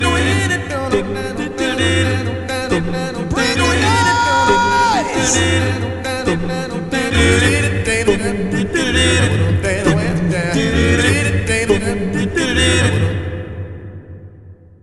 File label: Ditty; NBA Live 2002; PlayStation 2